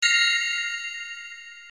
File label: spinner bonus; BurningCrow-V2